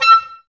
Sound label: OBS E, Oboes, Wood Winds; Miroslav Vitous - Woodwind Brass Ensemble